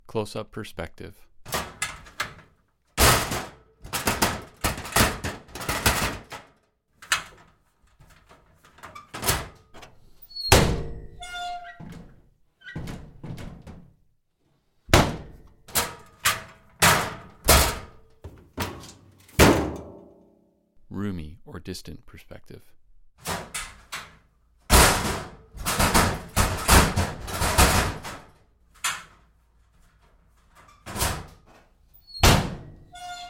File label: Locker Doors; Big Room Sound